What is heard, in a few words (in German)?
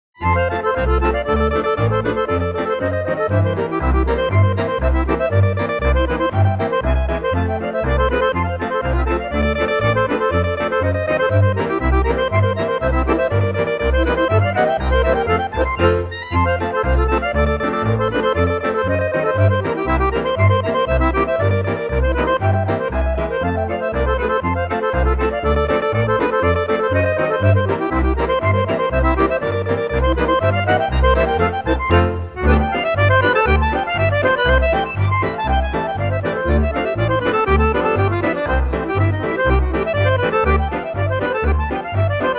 Schottisch